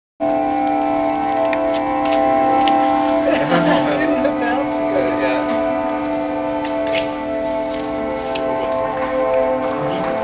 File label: music